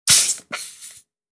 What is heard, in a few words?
Snake spit venom